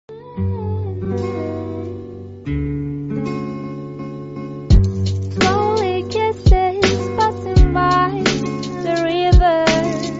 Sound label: Cute aesthetic intro; intro aesthetic SIN TEXTO